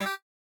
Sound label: Safe Click; Game rip; MiSide